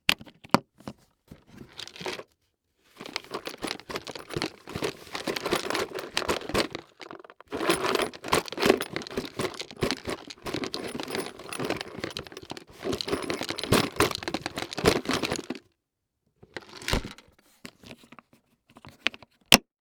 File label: Industry Tool Box Unlock Open Dig Through Close And Lock